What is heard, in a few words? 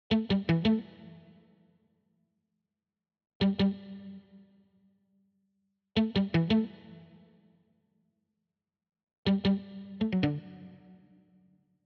Guitar